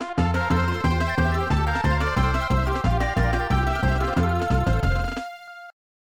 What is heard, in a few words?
Bonus Win, Song; DKC SNES